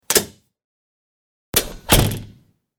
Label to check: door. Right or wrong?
Right